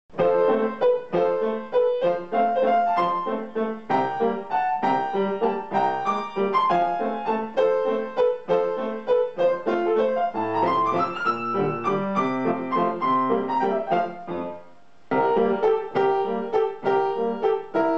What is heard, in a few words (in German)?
Komischer Walzer Kuckuck und Nachtigall